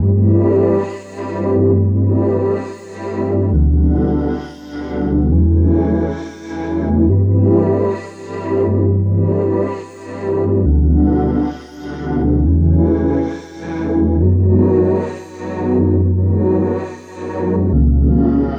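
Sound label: 135 pad G major; granair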